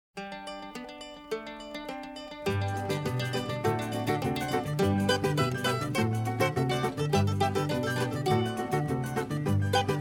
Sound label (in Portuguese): Bandolim